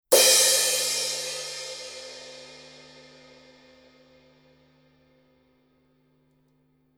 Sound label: paiste 900 18 crash edge